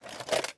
Shield Sentry Prepare; Hollow Knight; Ruin

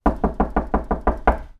Door Knock; battle suit aces

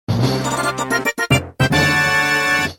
Level Complete!; Super Mario Bombastic Online

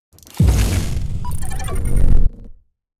Blaster; starbites

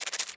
insect voice anger

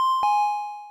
Pinpon; Syrup 2 Candy Alchemy RPG

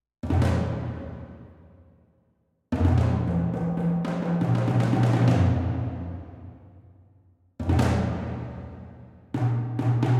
Dialogue Toms; BattleSabaku; Manago 2

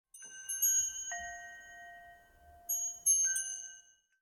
Ambience Scatter Chimes; Josh Journey Darkness Totems; PS4, Switch, Windows, Xbox One gamerip; Santuario

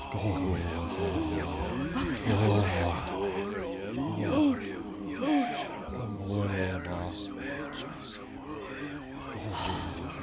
Crowd Loop; gamerip

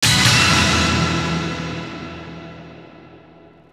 Str G#; Pro Yakyuu Spirits 3 (PS2) (gamerip) (2006)

